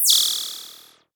Laser effector

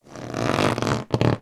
Rubber suit

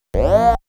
Tone windup

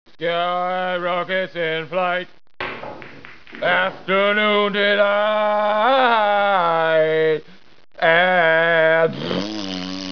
fart noises; Anchorman

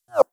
FX whizz

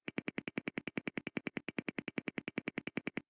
Flash clicks

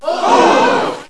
gasp ohhh

